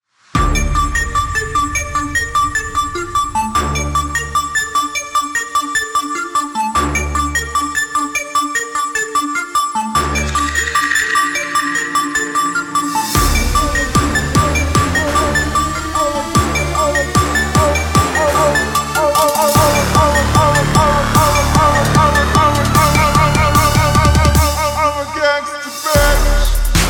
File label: trap monsters kit